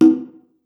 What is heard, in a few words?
mi conga, Percussion; realdrum